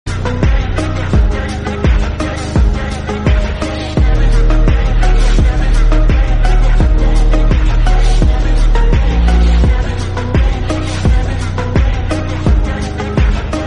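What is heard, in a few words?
slowed and reverb English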